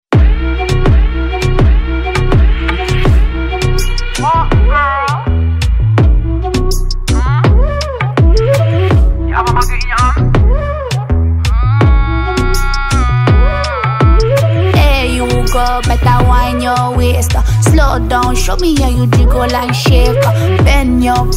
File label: Reggaeton; Solo Junior